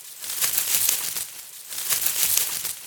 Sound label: Leaves Rustle; Princess & Conquest v0.20.06